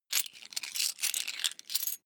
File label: coins big clatter